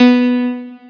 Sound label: piano